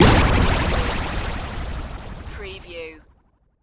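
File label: Production Element Hit Sweeper; Sound Effects Bible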